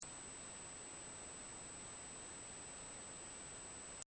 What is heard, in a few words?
beeeeeeeeeeeeeeeeeeee